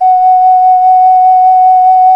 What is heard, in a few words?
OCARINA, Exotic Winds